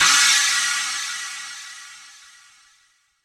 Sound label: big room crash sound sample f sharp key, Royality free crash cymbal single hit tuned to the F#